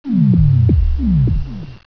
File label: bleep; qsound